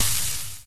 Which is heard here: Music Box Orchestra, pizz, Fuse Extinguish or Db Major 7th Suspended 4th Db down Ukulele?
Fuse Extinguish